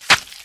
crunch; 2fast2curious

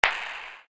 Klack Reverb; Kung Pow

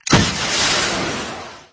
turret Fire; gamegape

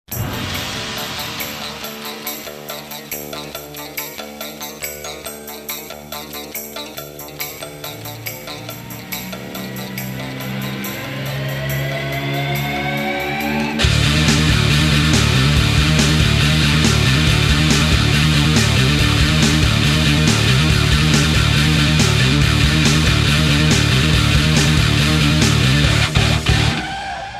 desert; newgrounds

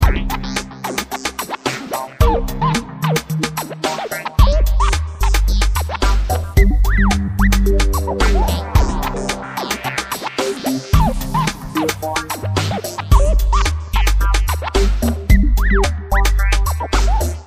loop construction suspense; rollercoaster

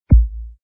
squeak

